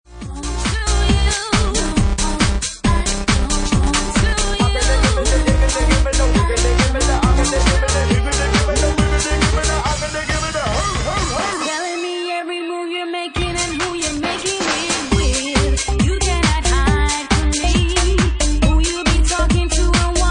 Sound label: Twisted Bass